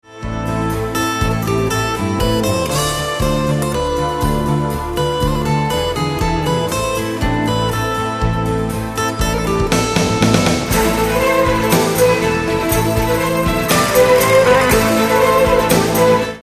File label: jigue